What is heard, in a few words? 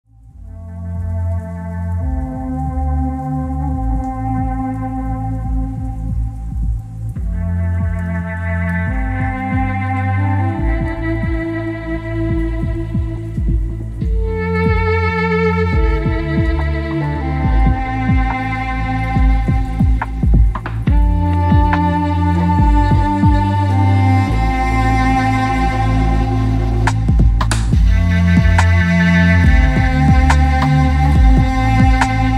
Instrumental music